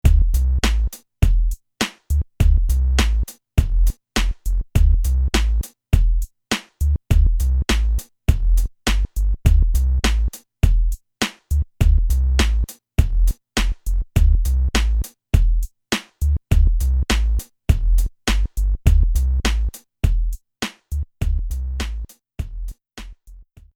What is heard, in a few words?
beat